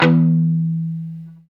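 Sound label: guitar chunk mute; 90's Sample CDs; Club-50 - Foundations Roland; Funk & Mute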